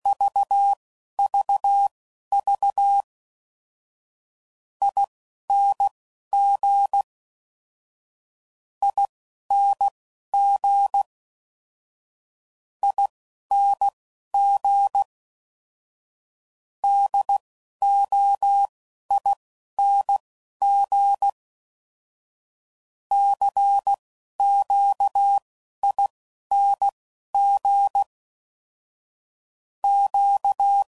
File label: Single INGs, CW Code Groups 10WPM; Morse Code; Mixed; Suffixes; Special INGs